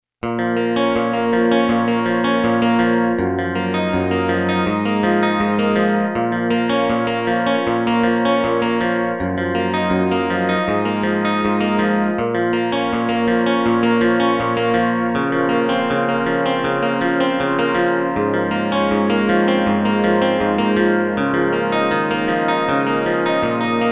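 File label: guitar lazy; E-mu Proteus FX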